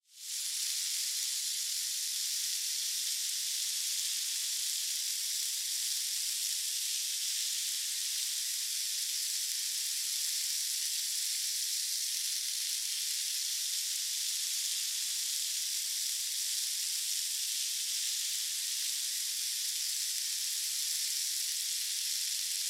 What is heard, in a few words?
Hourglass 30 Seconds; タイマー機器